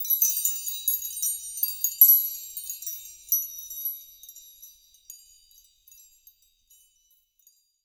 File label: Texture Wind Chime Keys; MatiasMacSD; FEEL TEXTURES VOL 1